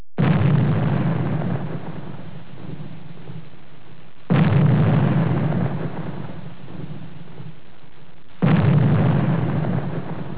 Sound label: naval gun